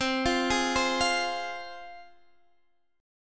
C Suspended 4th Sharp 5th, C, down, Guitar, Standard; Csus4#5 arpeggiated; JGuitar